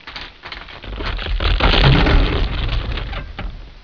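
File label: WOOD BREAK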